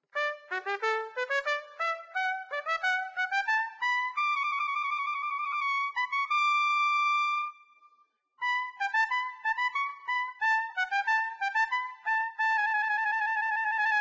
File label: Trumpet; Zomboid; Lifestyle